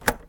turn key

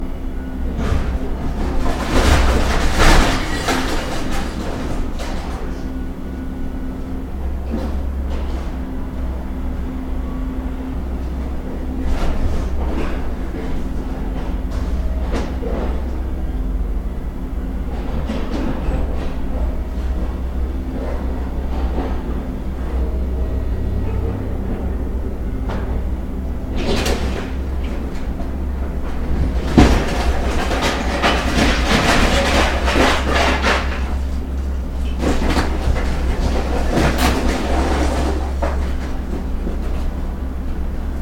breaking houses

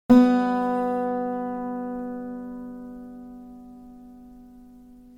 guitar

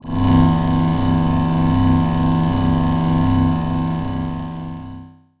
dark magic

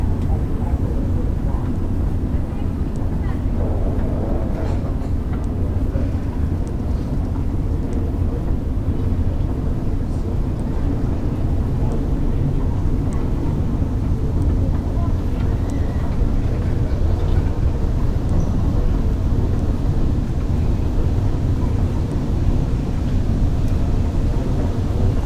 trans passage

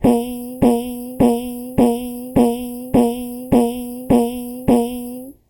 endless farts; anime

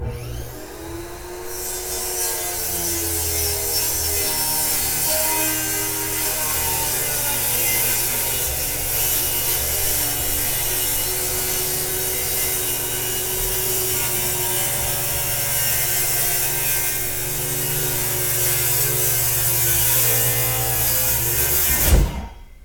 motor cutter

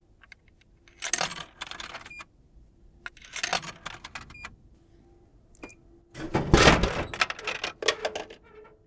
coke machine

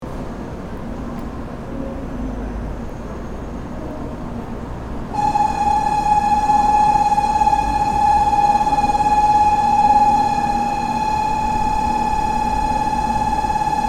Shinkansen siren

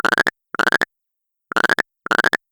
frog; nature

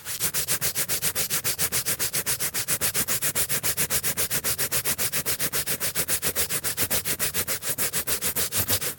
rub paper

